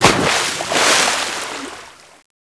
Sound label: splash impact; ambience; cstrike